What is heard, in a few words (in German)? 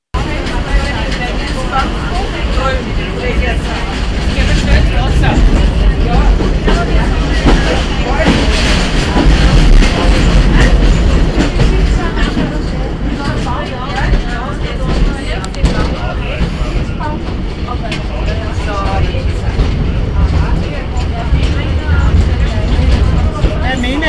Waelderbahn